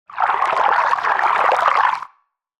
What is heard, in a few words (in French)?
eau; nucleaire